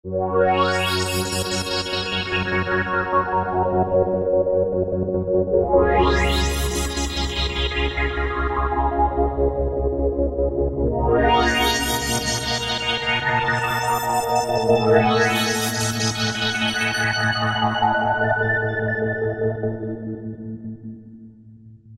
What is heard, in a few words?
Yamaha TG500 ME sweep; Internal Voice I